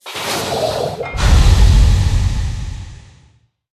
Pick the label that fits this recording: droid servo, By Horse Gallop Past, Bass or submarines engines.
submarines engines